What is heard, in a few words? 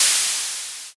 air release